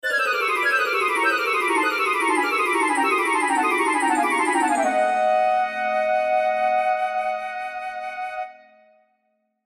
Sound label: Orchestral, Orchestral Chromatic Descends Falling Fast; Cartoon; Musical